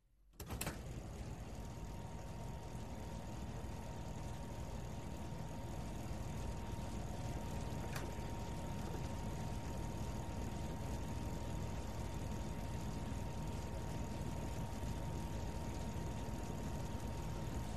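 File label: photocopier sounds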